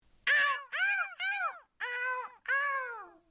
ウミネコの鳴き声

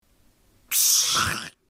紙を破る

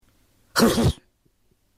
映画のパンチ音

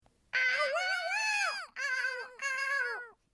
ウミネコの鳴き声; 海貓的鳴叫; 声マネキング; 声マネキング対決; フブみこさん; ホロライブ; さくらみこ

